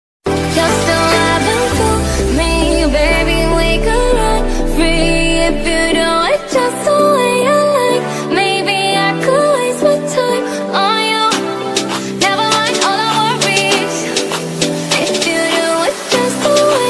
english ringtone english love ringtone english song ringtone